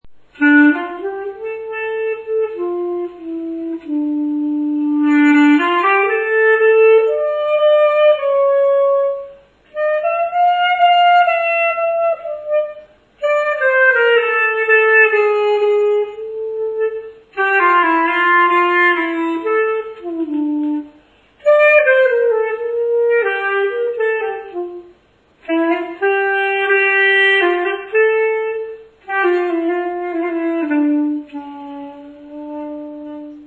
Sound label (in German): xa jiddisches lied, Xaphoon; Blasinstrumente